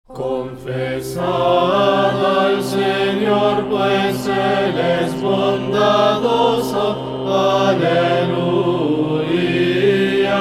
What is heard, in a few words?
O Give Thanks Spanish Tone 1